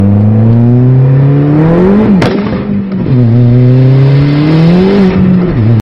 Mk4 Gti no lift

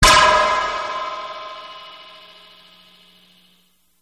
Scifi Laser Beam

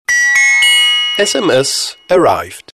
Sms Arrived

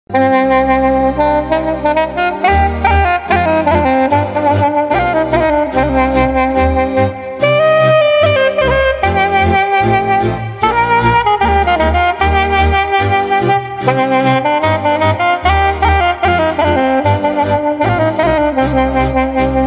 Romanian Folklor

